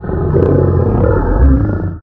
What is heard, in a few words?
lion, attack; fauna